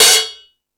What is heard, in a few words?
Ambient Rock, Drum Machines